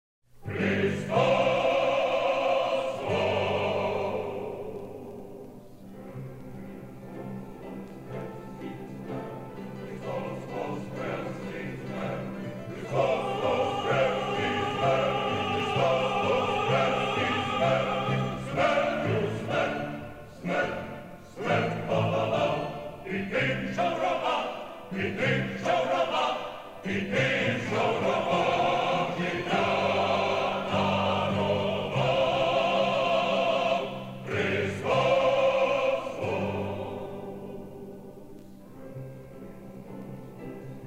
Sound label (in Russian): Христос Воскрес